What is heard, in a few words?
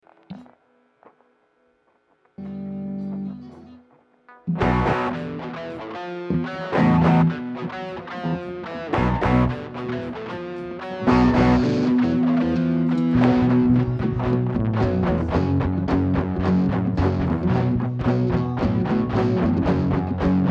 Guitars and Bass